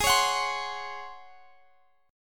Db Major 7th Suspended 4th Ab

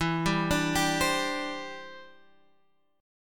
E Minor 7th Sharp 5th Guitar Drop D; chord shape

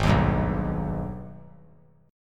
Ab Minor Major 7th, down; strummed; JGuitar

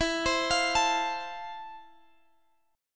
F Major 7th E

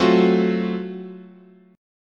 Gb Minor Major 7th Flat 5th, chord, guitar

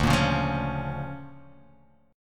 D Minor Major 7th Sharp 5th, D

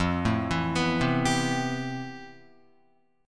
F Major Sharp 11th, Guitar; arpeggiated

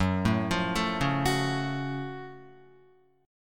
Gb Minor Major 7th Gb down Guitar; arpeggiated; JGuitar

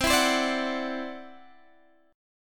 E 7th Suspended 2nd Sharp 5th C, chord

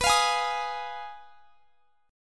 B 6th Flat 5th B down, chord

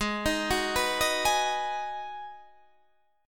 B 6th G sharp, guitar chord

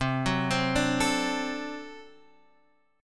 D 7th, C

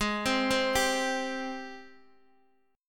Ab Major 7th Ab down Guitar Open D

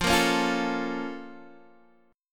A sharp Minor Major 7th

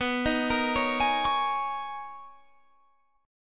B Major 13th B, Guitar, Standard; arpeggiated; 19,18,20,18,21,21; capo=2

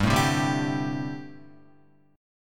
G Suspended 2nd Suspended 4th, Guitar; Gsus2sus4 strummed; JGuitar

